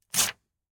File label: Paper, Tear, Check out of Checkbook; Half & Half Sound FX Pack 1